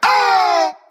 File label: "oh", group of men; ooh ahh